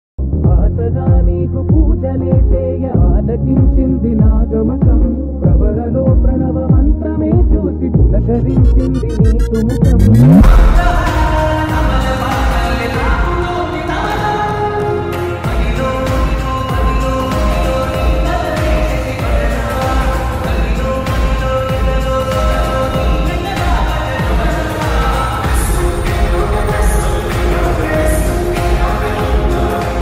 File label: slowed reverb ringtone, tamil